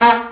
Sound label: Pouet